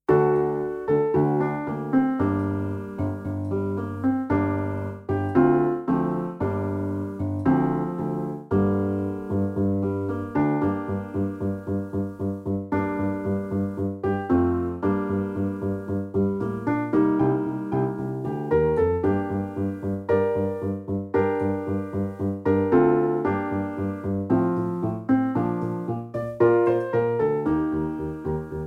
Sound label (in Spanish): BACKING ESTUDIO; ADIOSES SHOW CHOIR; THE CASSETTE EXPERIENCE